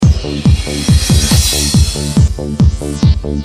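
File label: drum loop with pop music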